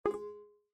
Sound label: Windows User Account Control